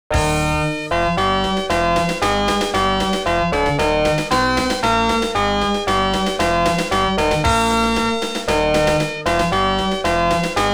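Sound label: Win, game music; arcade disc in jaleco variety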